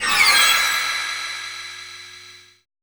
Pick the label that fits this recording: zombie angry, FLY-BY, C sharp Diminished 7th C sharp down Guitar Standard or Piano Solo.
FLY-BY